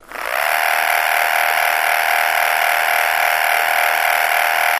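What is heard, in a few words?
Motor Small Low Speed, variable speed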